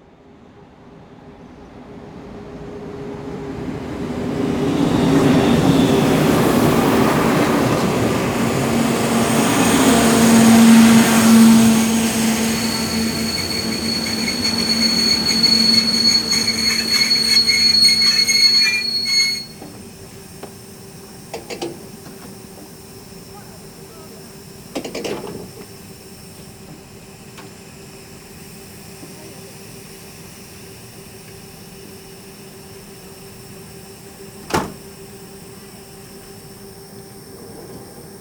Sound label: train passing stops; best service Blue Box